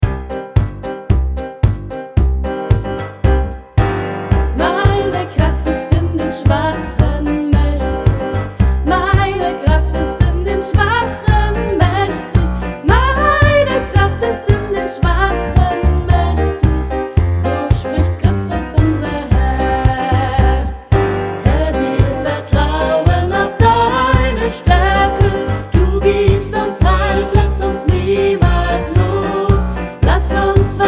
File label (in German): Kanon klezmer; Kanon no.2